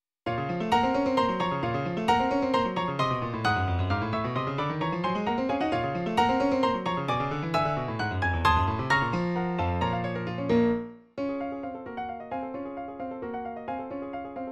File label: Allegro con fuoco for piano synth; Berry's realisation follows the composer's very fast metronome mark.